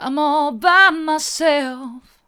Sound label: mid, vocal